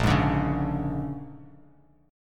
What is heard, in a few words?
Bb 7th Sharp 9th Bb, Bass Standard; Bb7#9; strummed; JGuitar